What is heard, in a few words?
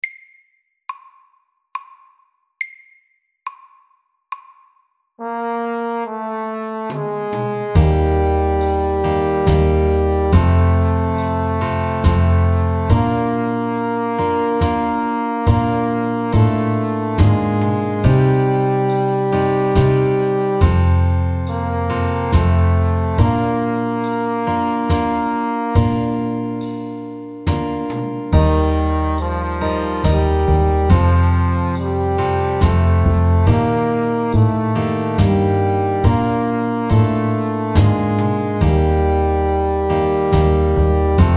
Ballade SLOW 70